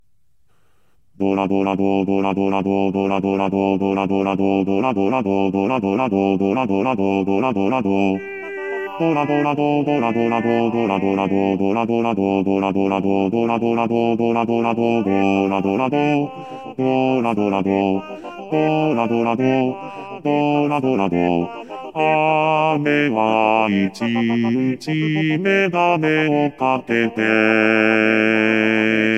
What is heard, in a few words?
doradorado bass v